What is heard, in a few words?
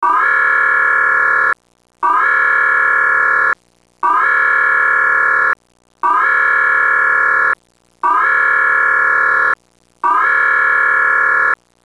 alert klaxon clean